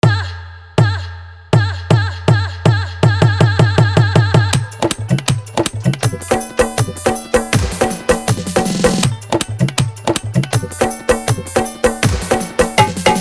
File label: Holi Song Dholki Bass Dance Mix